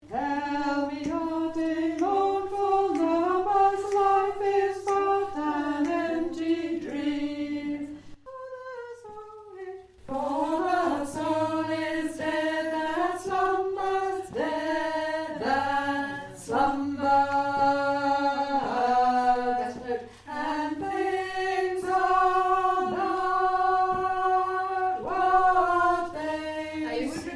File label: Alto